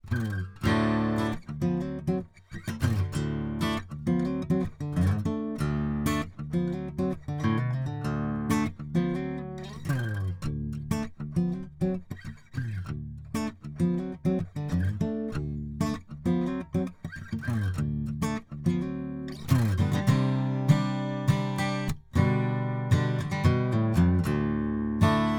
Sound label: Open Drop D Tuning; Samar MG33; DPA